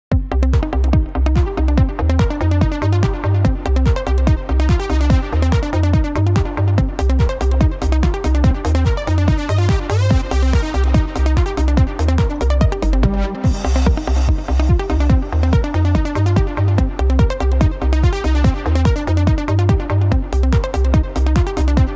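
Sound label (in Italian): Elettronico, suonerie per iPhone; iOS 26; Apple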